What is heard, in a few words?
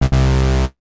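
miss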